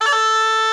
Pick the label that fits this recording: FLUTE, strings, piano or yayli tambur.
FLUTE